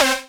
Synths Root C

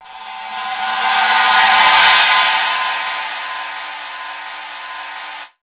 CYMB; Houseworx; Drums; Cymbal

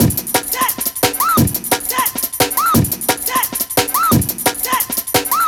175, classic dnb breaks

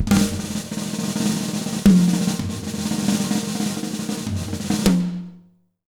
100 B BEAT, Jazz Latin Drumloops

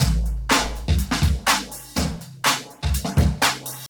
Meaty Flange, Drums & Percussion, Electronica; Sonic Foundry (Sony Creative Software) - Electronic Point Blank

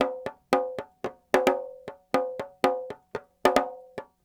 Petia, World Percussion

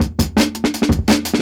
168, Jungle Breaks; Original Jungle Breaks

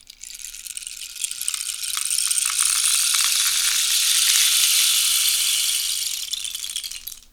RAINSTIK; PlatinumPhattCD2; E-MU Producer Series Vol. 8 – Platinum Phatt; Flexis Etc

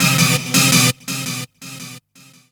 Technology Ana Stab; Riffs, Arps & Hits; M8

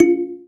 KALIMBA; Spectrasonics; Heart of Africa; KALIMBAS

